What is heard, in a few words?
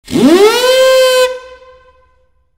Whoop